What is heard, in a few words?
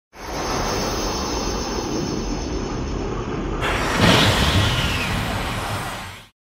aura sound effect; ssb